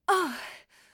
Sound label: Falling